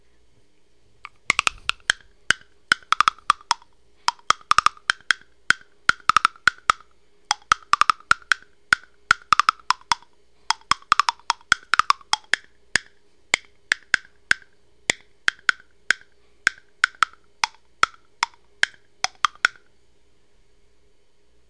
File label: clack, jews harp